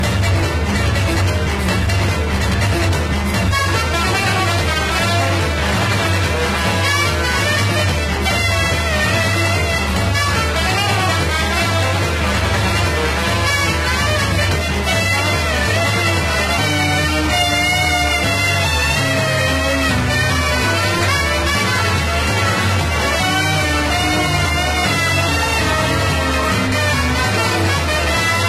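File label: distort, music; ghost house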